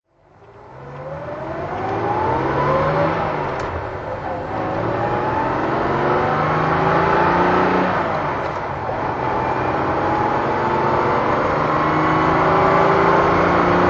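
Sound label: jaguar mk2 38 manual drive, Engine sound driving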